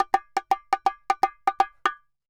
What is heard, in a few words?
Bongo Poly 120, Drums & Percussion; Best Service; Festa Latina VOL-1